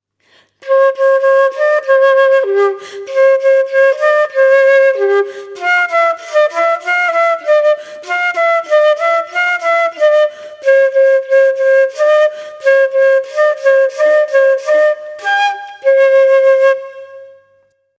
flute traversiere; une sourie verte

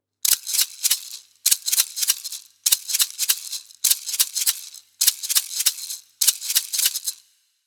impro maracas; loto sonore

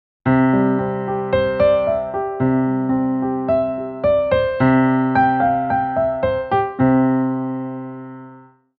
impro piano; loto sonore

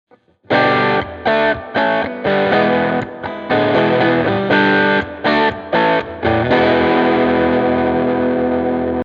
impro solo guitare elec; loto sonore

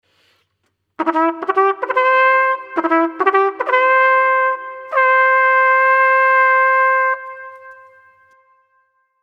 impro trompette; loto sonore

